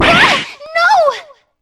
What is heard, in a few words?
rise damage; p4u